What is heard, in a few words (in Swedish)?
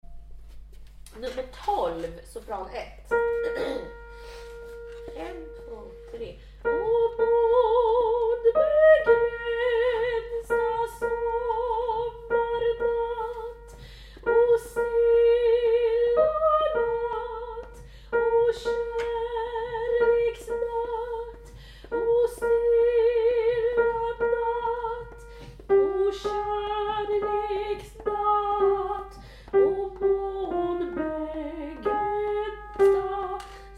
Muntra sopran